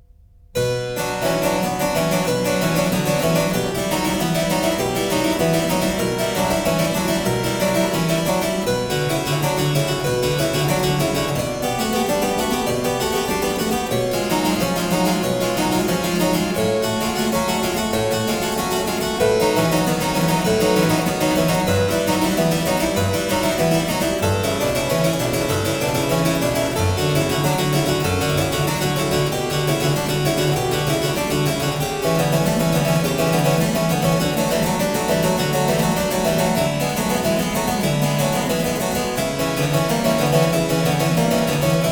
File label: Prelude & Fuge in C minor, Keyboard; No.2; BWV 847; J.S. Bach; The Well-Tempered Clavier Book I